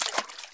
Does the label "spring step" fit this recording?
Yes